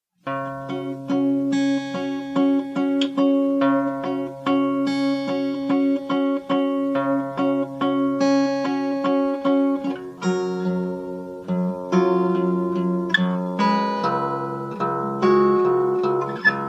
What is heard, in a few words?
little waltz